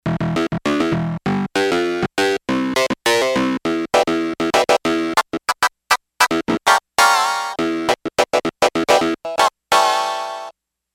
Clavi

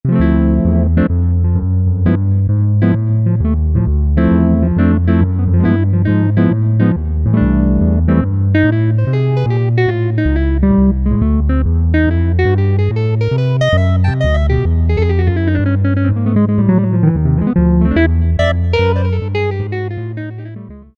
Warm Solo; Generalmusic Equinox

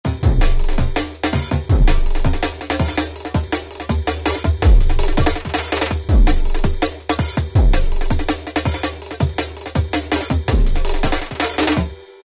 Flangungle, Korg ES-1 Factory Patterns

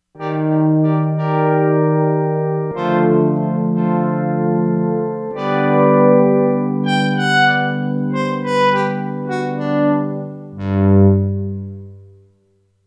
Fat Strings, Korg MSC-13S Ethnic 2

